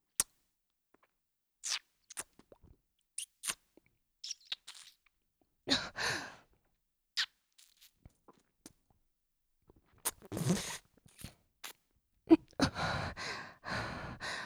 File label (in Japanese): キス; マゾハーレム